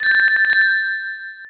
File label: hurt; sonic the hedgehog